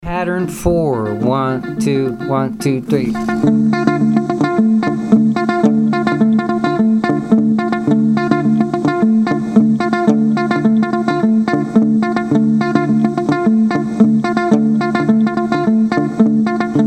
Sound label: mozambique patterns